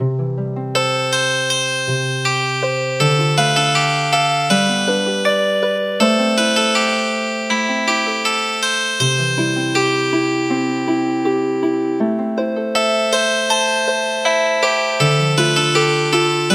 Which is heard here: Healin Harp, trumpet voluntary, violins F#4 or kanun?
Healin Harp